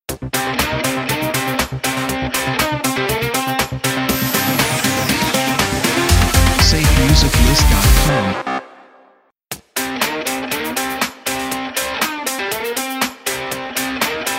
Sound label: Angry Dancer Intros; SafeMusicList